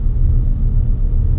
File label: street int; ambience; narshaddaa